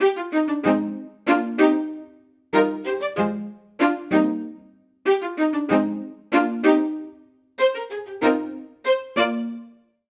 Ostinato Summer Pop Ab Major 95 bpm, String Quartet; MIDI Clips; Ableton; Spitfire Audio